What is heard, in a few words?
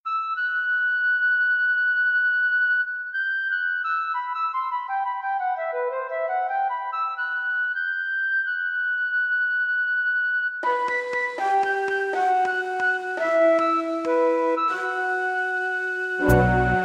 solo